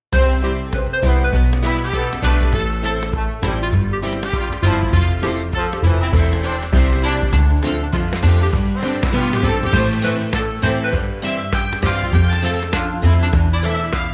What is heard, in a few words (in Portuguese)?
DIXIE instrumental, flauta de bisel